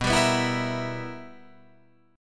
B Major 7th Suspended 4th Sharp 5th Guitar Drop D, chord